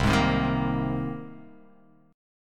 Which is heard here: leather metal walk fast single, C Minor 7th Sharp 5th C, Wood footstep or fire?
C Minor 7th Sharp 5th C